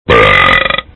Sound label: burp